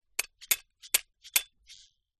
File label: household bbq tongs close x4; zapsplat